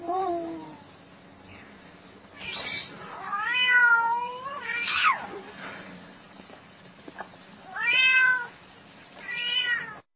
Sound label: CAT MEOWING